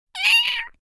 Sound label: Cat Message Tone